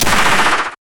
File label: ak47